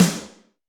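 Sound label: Snr Verbose, snare; Alesis D4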